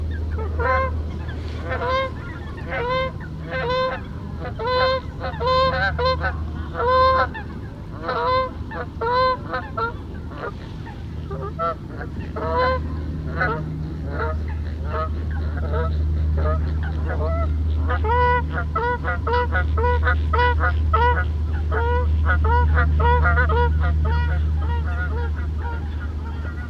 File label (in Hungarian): kanadai lud Branta canadensis